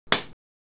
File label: clap